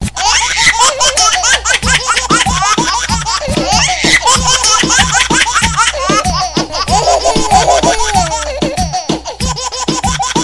risada show

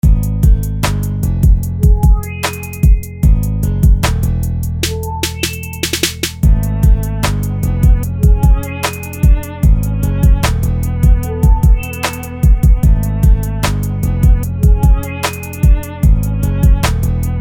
producao beat

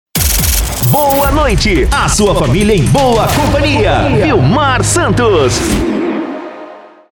vinhetas rádio

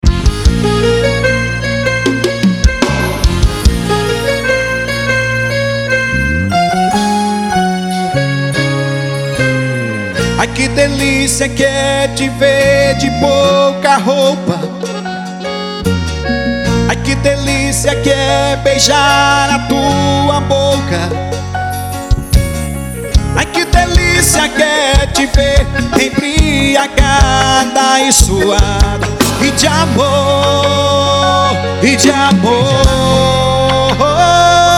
forro

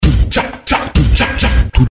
beat kikando

